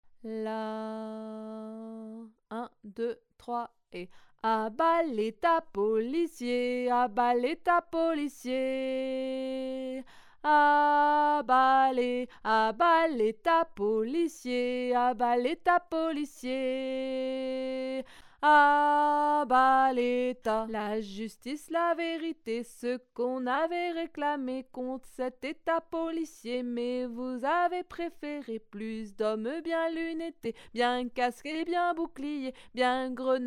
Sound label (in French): lead